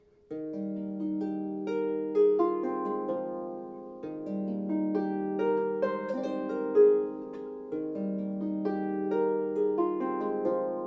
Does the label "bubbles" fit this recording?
No